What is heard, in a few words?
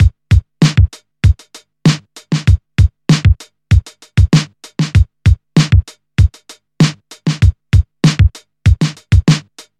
97 bpm drum loop g key